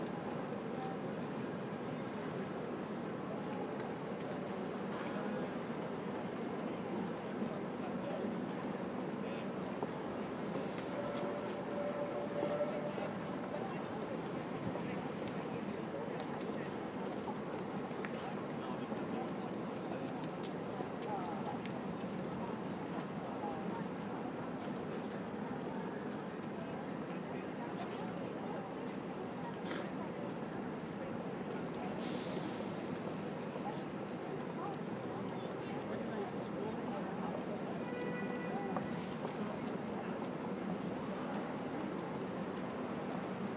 60 seconds of picadilly gardens ambience manchester